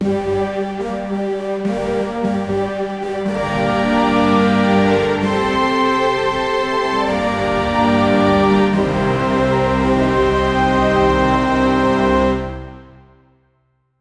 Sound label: intro orchestra music, intro